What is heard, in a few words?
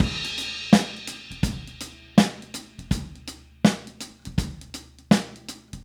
Soul Drums Crash, 70s Analog Funk; Sonic Foundry (Sony Creative Software); What it Is!